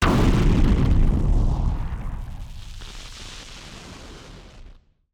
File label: Party Popper FX